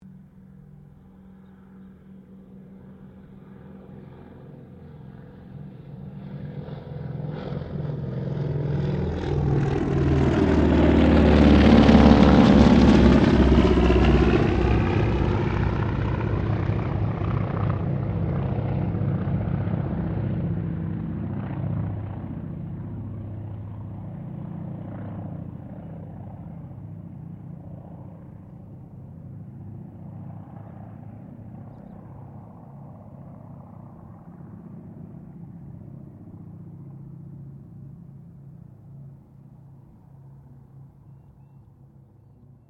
AIRCRAFT CERES WINJEEL WIRRAWAY PASS; Mixed aircraft squadrons; Airplanes (Sound Effects:Vehicles)

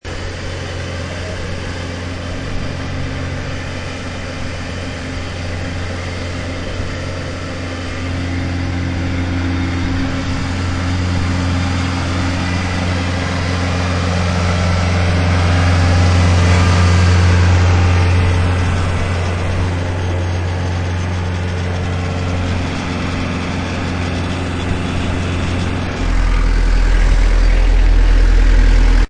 Sm Frm Tctr, Small farm tractor drives; Tractors; Vehicles

